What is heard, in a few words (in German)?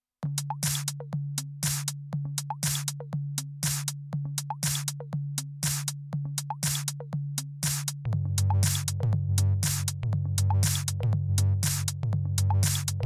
Volca Mix JAM Ohne Send AUX Feedback